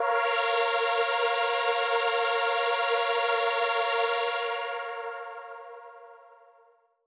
Dramatic A#4; KG MegaSaur

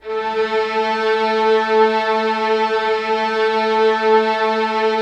LUSH, Lush Strings, Lush Mello; Optical Media International; Sonic Images Library

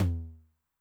Noise Med, 909 LOW TOM

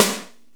FM DRUMS; East West; Global Sounds

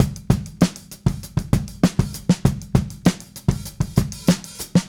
Smooth Groove Drums, 70s Analog Funk; Sonic Foundry (Sony Creative Software); What it Is!

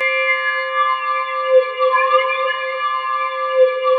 DIGIT WHA; VFX-SD #7

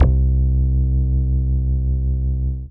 DM BAS, Dance Mega Synth

